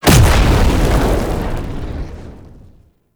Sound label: Cast Impact; Warframe; Uriel; Infernalis